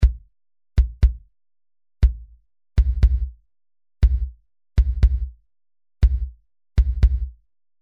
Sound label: Bass Drum; Nonlin2